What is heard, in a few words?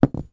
alum key drop on carpet floor; industrial tools; sound effects; sound effects one